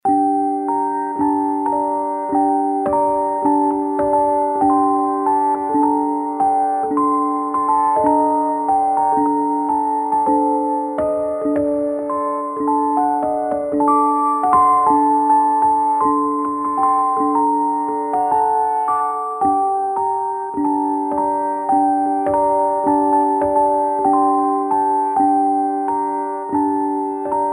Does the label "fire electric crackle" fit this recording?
No